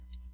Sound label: tick